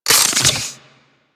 Sidearm Reload, Weapons; Warframe; Tenno; Wraith Sidearm

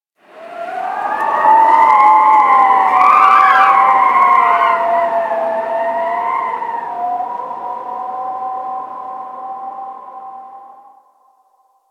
Ice Cold Wind Whistle; Ambience; Warframe; Corpus Ice Planet

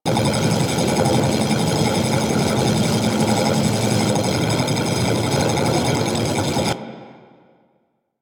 Turret Tether Loop; Warframe; Zariman

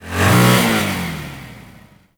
Infested Hammer Rev, Weapons

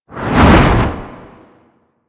Arch Wing Dodge Roll Left Low, Vehicles; Movement; Warframe

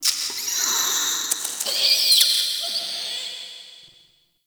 Rat Vocal, Crypt Ambience; One Offs; Champion Crypt

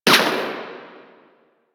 Rifle Fire; Warframe; Caliber Chicks minigame

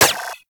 Braton Prime Redux Prime Layer, weapons; Warframe

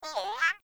Flying Robot Voice; Quests; Veilbreaker; Ambience; Kahl Quest

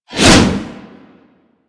Hammer Whoosh B; Warframe; Weapons

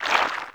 Root Cave Mushroom Squish; Ambience; Props; Neathuns Root Cave

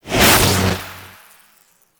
Operator Focus Cloak Pulse; Powers; Warframe; Operators

